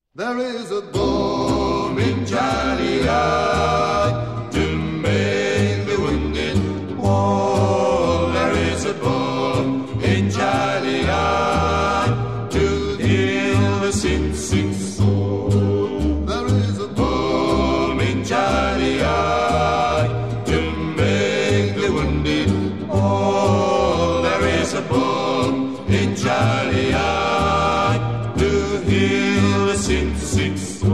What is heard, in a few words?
There is a balm in Gilead tutti